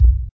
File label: house Kick; House Kit; STYLE KITS (Hip Hop, Etc); Choosy House Kit